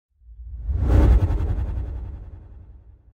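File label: transition disappearance gradual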